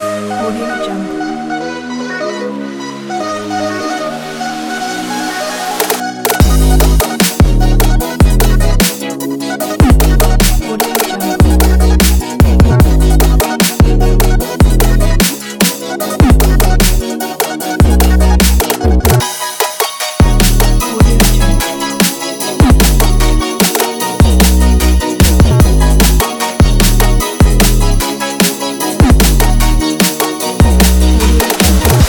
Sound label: energy future bass